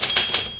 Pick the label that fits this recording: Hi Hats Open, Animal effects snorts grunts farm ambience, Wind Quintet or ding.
ding